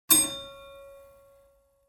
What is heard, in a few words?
Ding, The sound of RT3316's bell, one ding